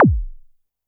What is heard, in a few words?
korg trident